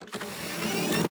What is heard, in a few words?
elevator start